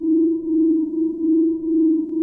JUNO 60 C3; Hollywood Edge - Giorgio Moroder Rare Synthesizer Collection

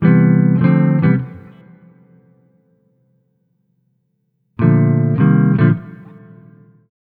Multi Guitar Swing Gtr-C, 105; Zero-G Kocktail Kollection; Construction Kitz (Lounge)

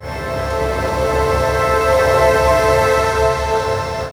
C, Pads; Zero-G; Total Drum Bass

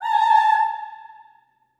Staccato F A5 L, Symphony of Voices; Spectrasonics

